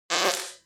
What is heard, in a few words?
fart loud and close anal air escape push